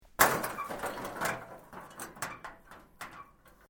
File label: METAL SIGN MOVE; Sound Effects:Materials; Foundation3